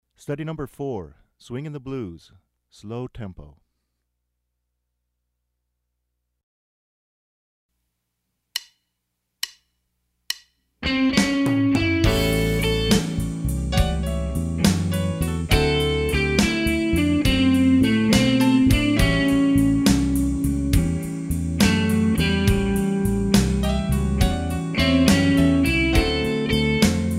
Swinging the Blues Slow Tempo

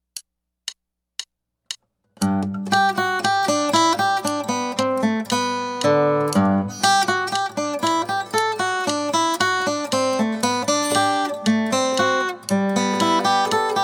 pickings studies in G slow

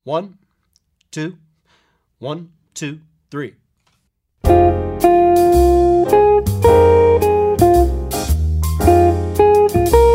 Minor With Soloist

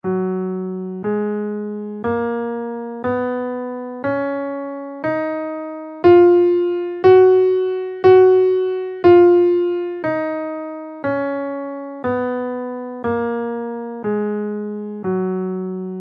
ionian scale in F

